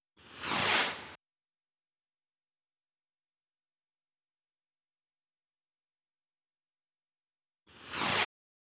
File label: Drum Loop 128 BPM sweep; VEC5 Multi Drum Loop 50